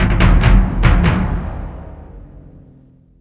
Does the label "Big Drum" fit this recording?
Yes